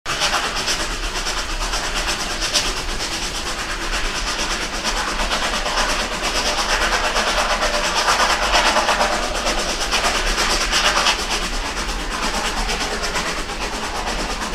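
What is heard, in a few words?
very fast steam locomotive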